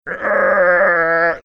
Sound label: making tense riding roller coaster sound